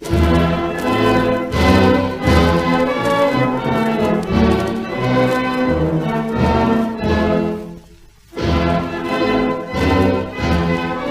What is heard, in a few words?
1930s instrumental; Hey, Slavs